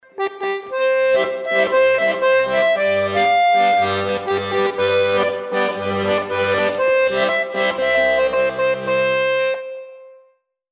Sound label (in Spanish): acordeon